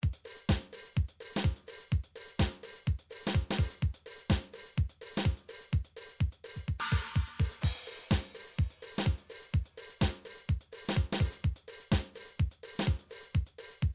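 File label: drum underground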